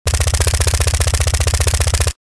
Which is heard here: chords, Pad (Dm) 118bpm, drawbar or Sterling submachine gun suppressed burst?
Sterling submachine gun suppressed burst